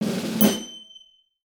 kids game win awarded positive drum cadence bell ding; chime